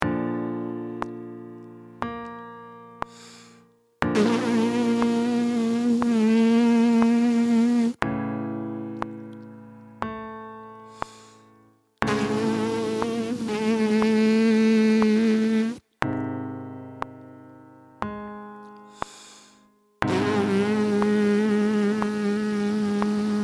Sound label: Warm Up With Me Lip buzz, trumpet